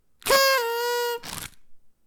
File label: party